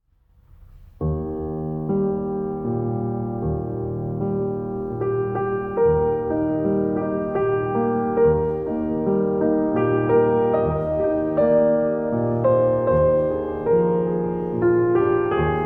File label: lullaby wiegenlied